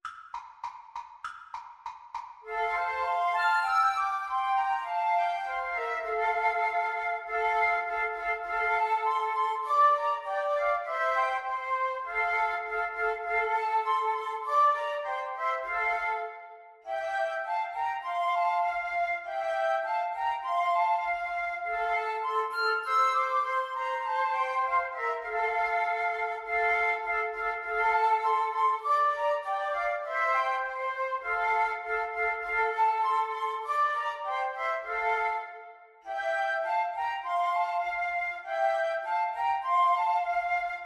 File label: early one morning, Flute Trio